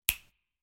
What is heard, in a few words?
check, chess